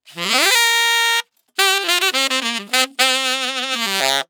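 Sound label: 110 NuJazz Sax G major; Digidesign; Pro Tools; Pro Tools Essential Loops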